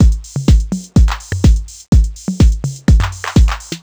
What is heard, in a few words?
drum loop clip full 125, Classic Chicago House; Sample Magic; Drum Loops